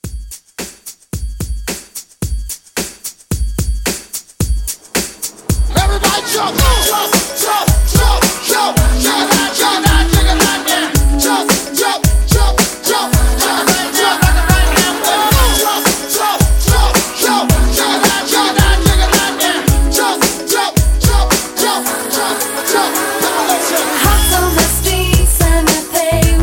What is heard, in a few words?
Kool Hype Re Drum 110 CLEAN